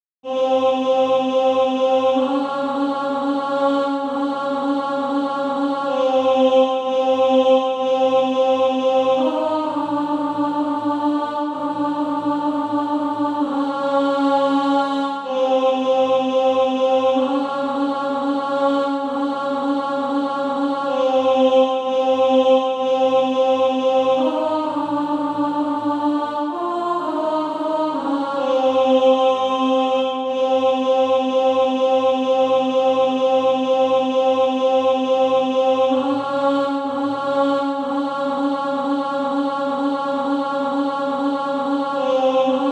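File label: alto, Practise Music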